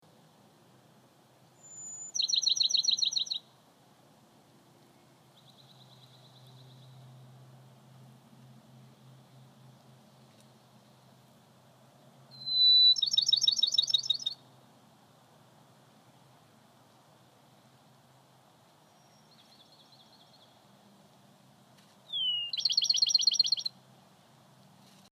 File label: Bachmans Sparrow Peucaea aestivalis